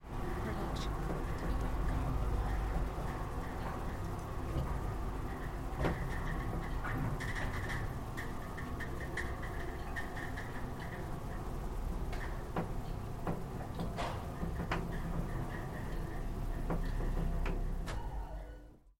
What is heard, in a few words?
elevator mechanism wide; soundscrate